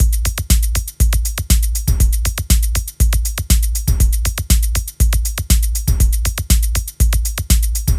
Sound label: 120 trance beat, Drum & Perc Loops